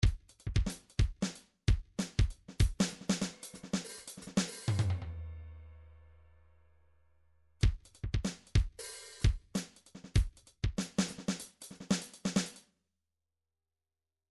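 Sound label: drums gogo fill; Paul Kodish Dangerous Drums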